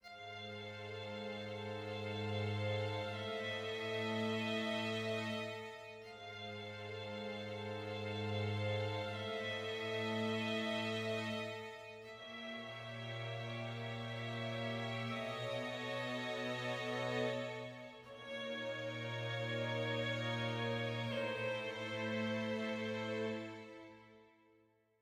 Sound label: dark med